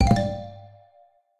button Spin; wild turkey

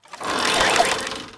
sign spin; irish pot luck

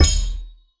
wild Land; riches of midgard

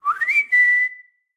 c whistle; willyshotchillies

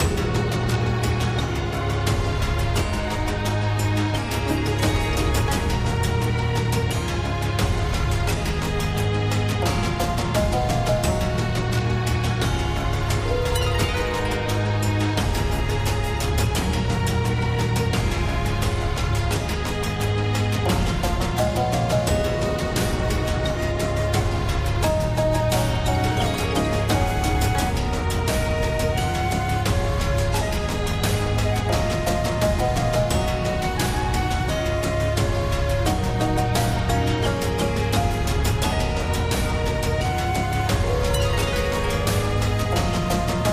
ambience Bonus Game; koi princess

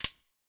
reel Bounce; dead or alive 2

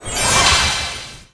random symbol transform; fairyred

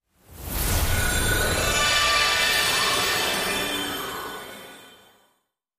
random Symbol Transform; fairyred

